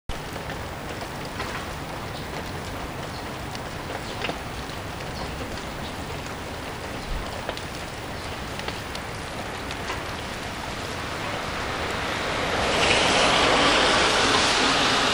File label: rain; CM-DS6